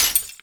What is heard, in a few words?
glass shards; Call of Duty Modern Warfare; physics